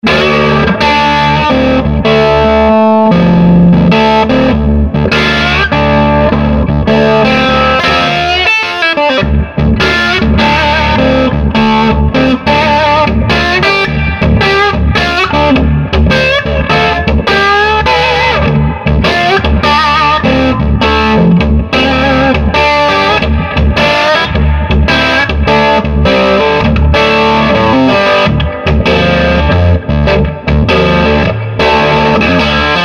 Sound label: SG crunch; swart ast pro